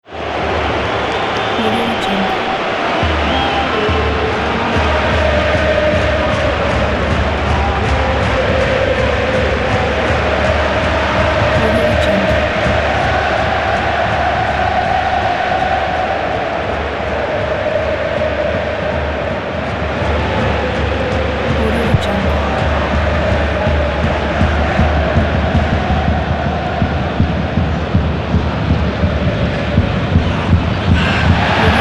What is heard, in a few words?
soccer stadium crowd singing and drum kicks